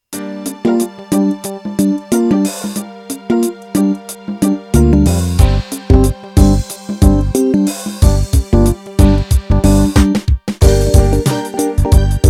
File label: Pop Shuffle